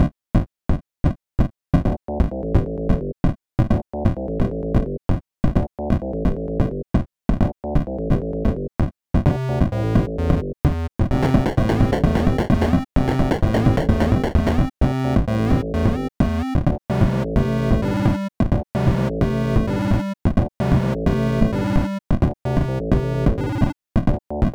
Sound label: song